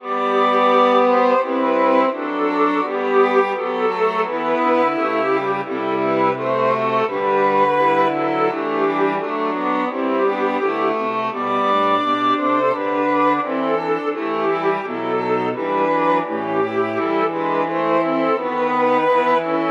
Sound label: Choral strings; tis the season